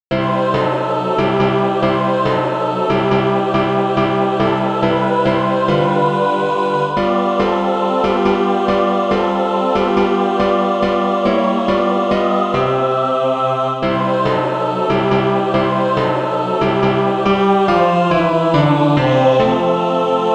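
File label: mixed, Practise Music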